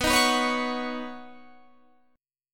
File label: B Major Add 9th, B, down, Guitar Standard; Badd9; strummed; JGuitar